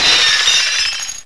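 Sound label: glass break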